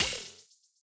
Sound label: shock end; CrossCode; puzzle